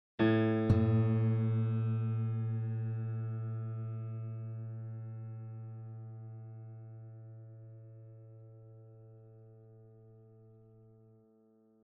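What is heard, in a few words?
Piano Guitar Out Tune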